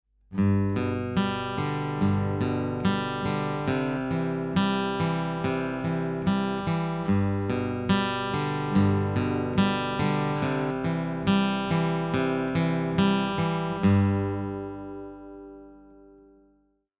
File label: Picking Pattern